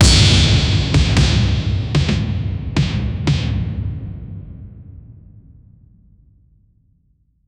heavy war drums and, chant

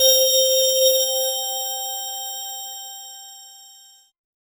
a clean digital bell synt; crystal shimmer

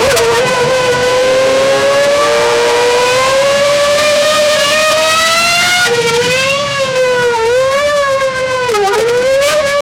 the latest formula 1 car; cars

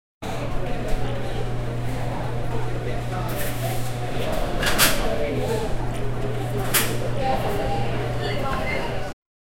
sound in a shoe store, store

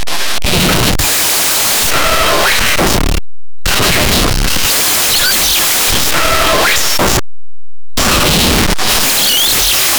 suspenseful but funny sci, ambient pads

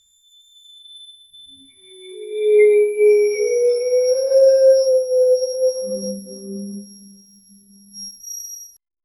appearance of the fairy

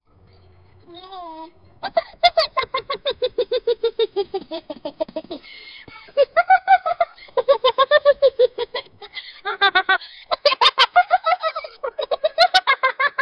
hyena laughs hyenas ambus; ambush

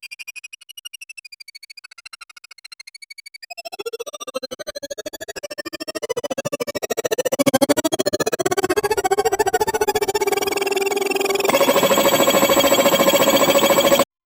flying luminous particles, accelerating

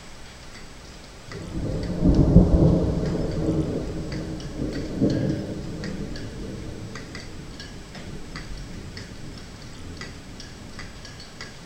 sound of steady melanchol, instruments

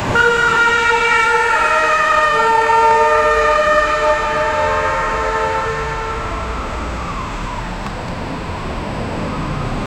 a chaotic city street; car horns

